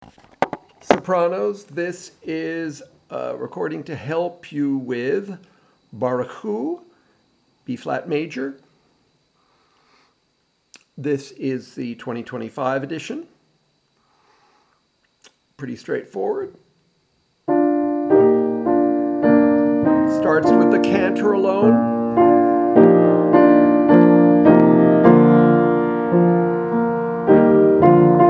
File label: Barchu B flat major soprano